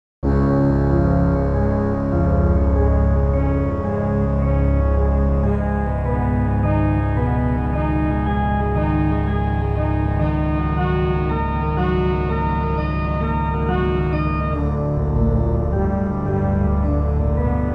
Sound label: cathedral, fractal music Chord Progressions